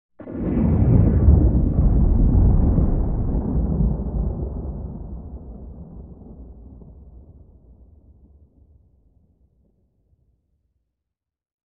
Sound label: Thunder - Eerie distant clap, with long tail; Hollywood Edge Sound Designers Tool Kit